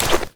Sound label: tiny equip